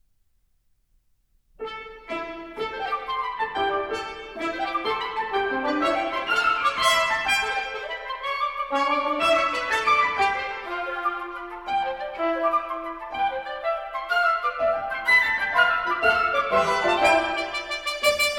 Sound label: divertimento, overture, orchestra